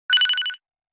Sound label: Basic Hi, Business Rings